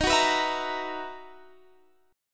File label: B Major 11th D sharp, guitar